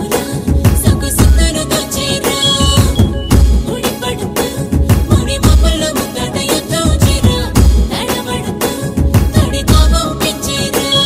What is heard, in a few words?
Latest Telugu Ringtone